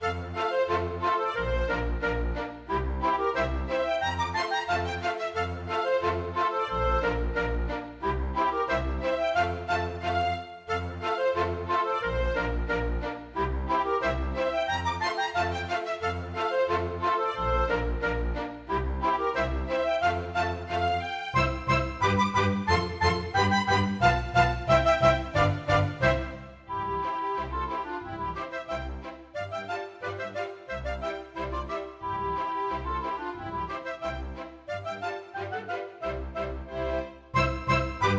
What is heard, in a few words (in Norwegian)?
Polka; storgårdsmusikk